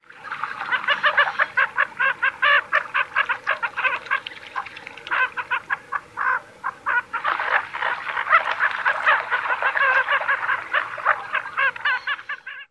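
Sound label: Bufflehead courting group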